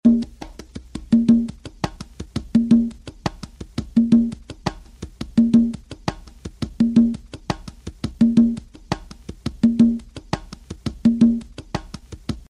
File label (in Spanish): conga patron bateo marcha; Interpretación musical para bailarines